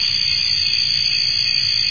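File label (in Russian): сверчок массовый шумный приглушенный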